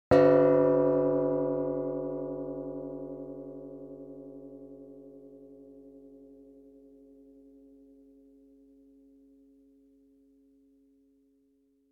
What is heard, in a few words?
Small soft gong hit